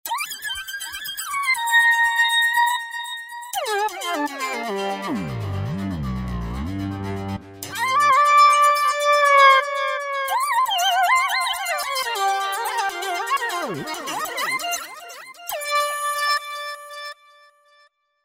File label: Living Lead, Roland V-Synth; Factory Patches